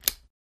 Light Switch Lamp

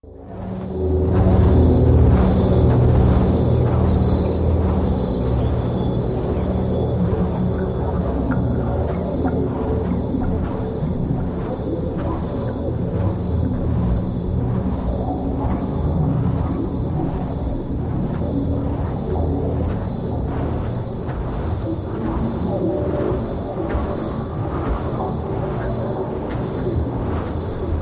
Storm Light Machine, liquid

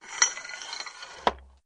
Tripod Leg Telescop

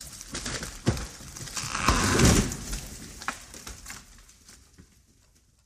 Tree Big Creaking; trees

